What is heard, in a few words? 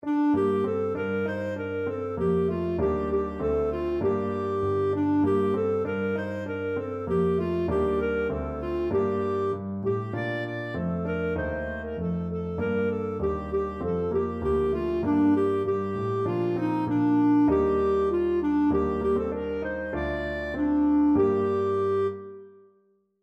huron carol, clarinet